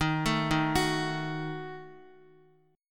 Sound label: D sharp Diminished, down, Guitar; arpeggiated; D#dim; JGuitar